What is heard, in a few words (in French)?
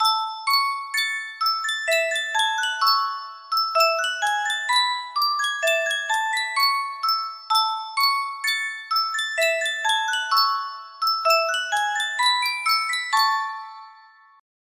yunsheng boite a musique